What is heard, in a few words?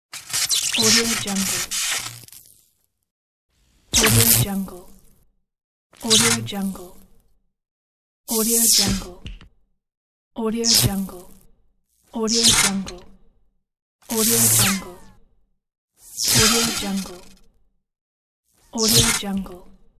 electric spark discharges